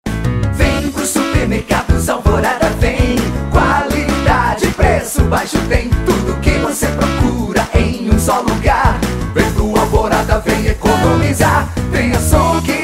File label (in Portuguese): jingle supermercados; supermercados alvorada